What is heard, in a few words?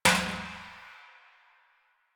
Metal Trash Can Impact; Warframe; Ambience; Nineteen Ninety Nine